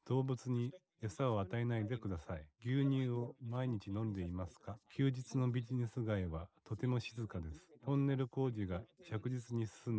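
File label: male, instantaneous mixture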